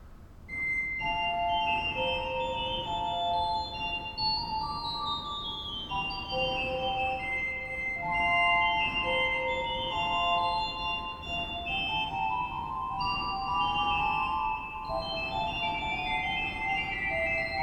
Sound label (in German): Waldhorn 2, Kirche Thalwil Kuhnorgel